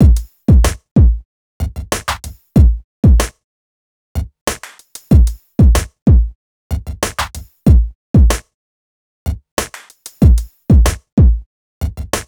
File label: reggae